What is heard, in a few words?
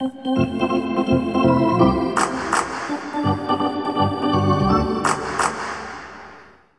Hockey Organ